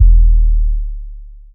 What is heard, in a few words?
ELE BD, Drums Dance & Groove; USB Soundscan vol.11 - Drums Dance & Groove; AKAI